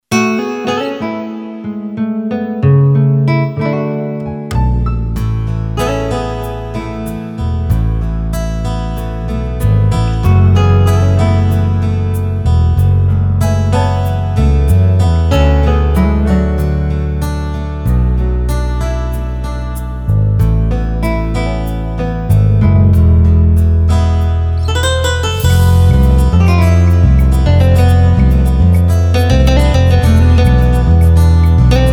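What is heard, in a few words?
Spanish Guitar, Yamaha PSR-550; Factory demos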